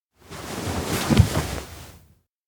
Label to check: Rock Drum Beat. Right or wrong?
Wrong